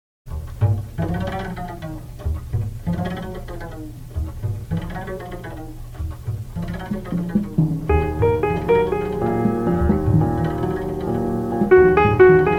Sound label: Intro Outro